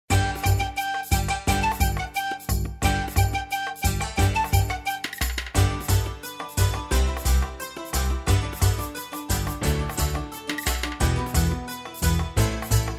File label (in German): nur Begleitung